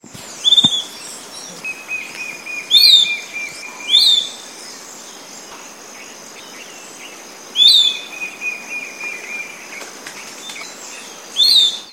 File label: Legatus leucophaius; PN Itatiaia